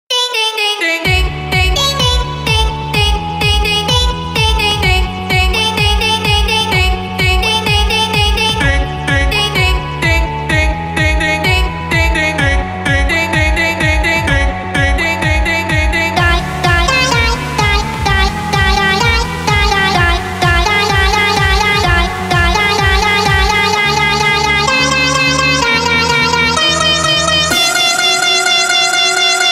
song cover

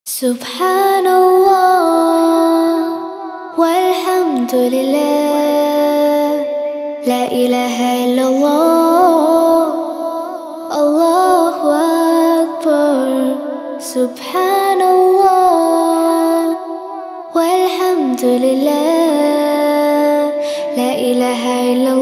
tasbih vocal only

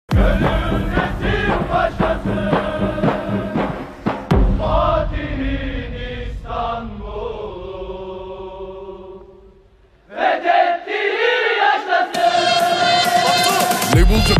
mehteran remix beat

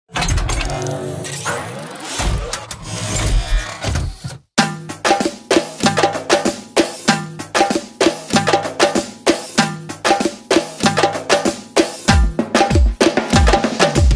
yamaha psr sx900 ritm; kelin salom uchun

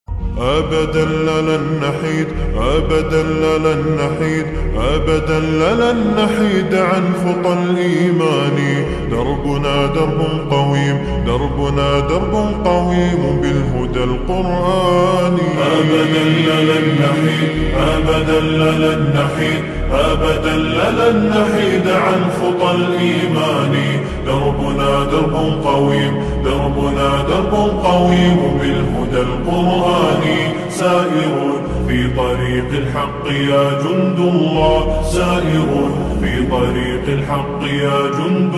islamic nasheed slowed reverb

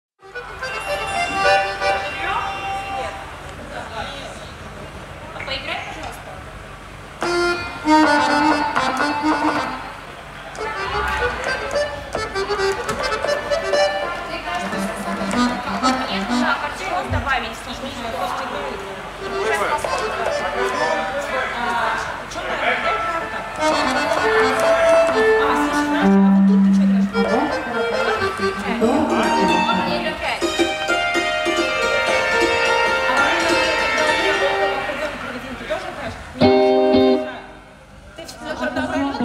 orchestra dress rehearsal drums in front

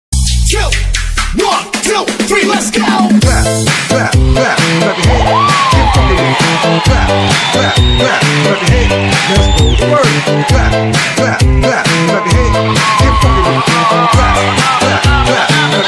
reggae disco remix zumba dance remix

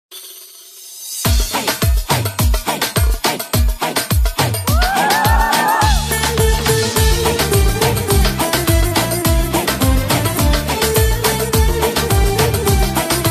arabic music

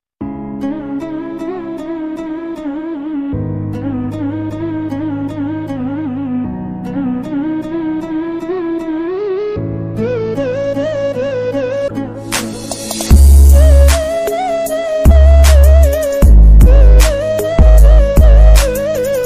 minus bass

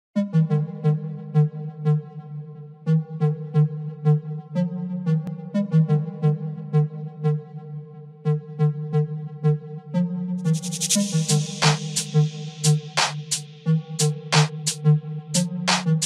37hz screwed low bass

